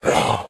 zombie

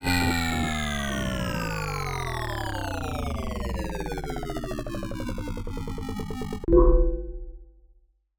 lose; upgrader

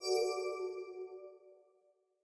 wild swoosh; berry burst

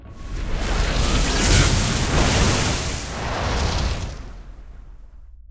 heaven Character Feature; archangels

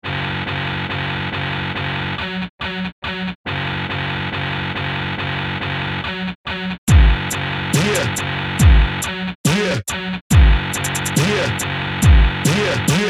arp

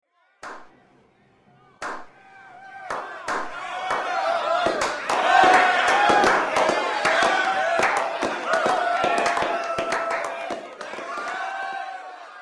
cheer

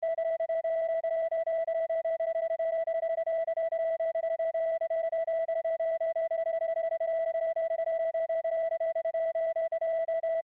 typewriter sound effect

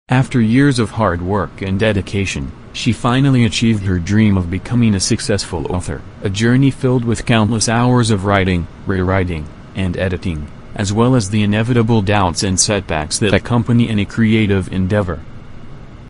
airconditioner noise before

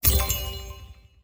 magic

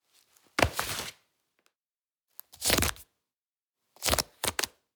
Baseball Glove Handling; Sports

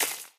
block stone destroy

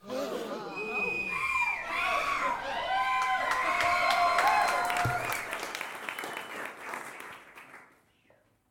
crowd Reaction brothel big Reveal; spellbound

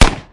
uzi shot

